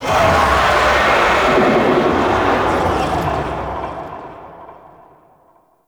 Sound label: Distant Oni Vocal; Warframe; Cetus; Cetus Invasion; Ambience